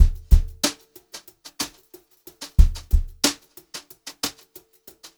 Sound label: brushed drum loops; USB Soundscan vol.39; AKAI; 90 s Sample CDs